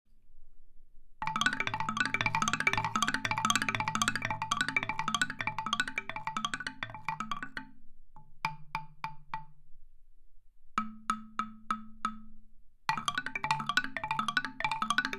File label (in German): Rührtrommel